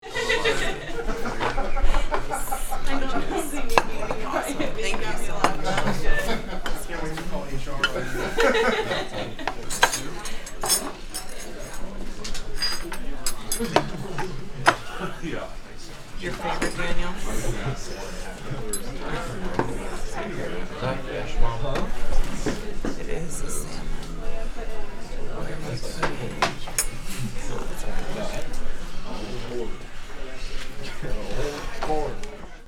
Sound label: Restaurant ambience quiet light activity